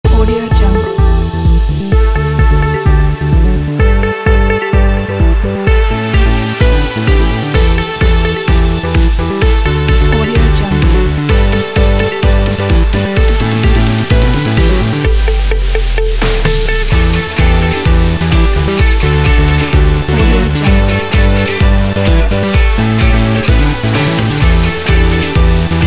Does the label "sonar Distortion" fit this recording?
No